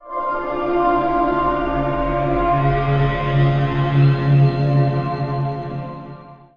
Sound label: gentle spooky pad; Pads